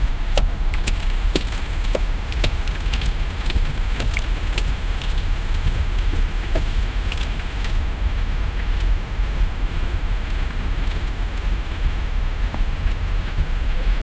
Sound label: background noise at a car; ambient noise